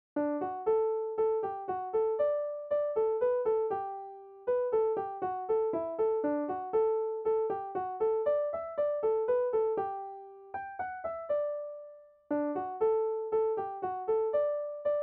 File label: mazurka, irish music; sonnys mazurka no1